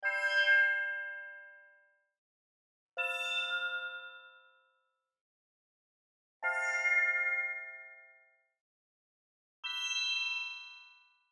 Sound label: Arcade Click Neutral; boom library; The Interface